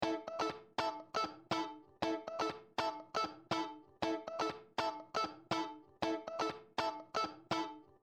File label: Funk Guitar room